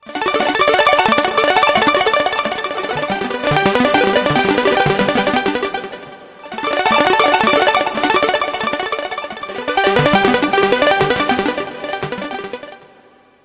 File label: loud windchimes, ringtones; ZTE Open C